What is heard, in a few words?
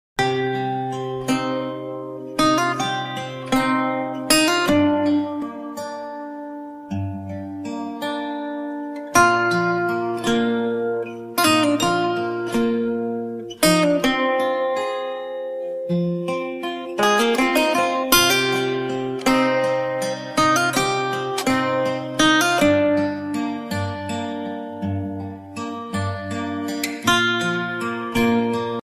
guitar ringtone; game of thrones